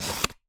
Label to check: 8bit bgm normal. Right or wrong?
Wrong